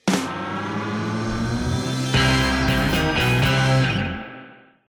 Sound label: Cars Celebrate Stinger; Disney Infinity